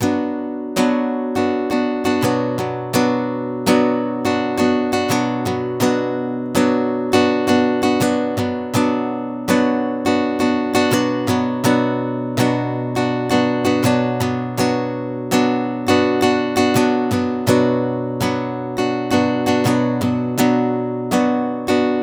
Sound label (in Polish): bicie w podziale szesnastkowym akord h, gitara